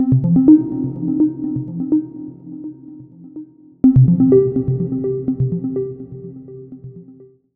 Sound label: Sine notifications